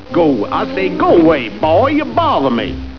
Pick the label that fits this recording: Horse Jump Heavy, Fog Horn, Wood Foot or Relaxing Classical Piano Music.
Fog Horn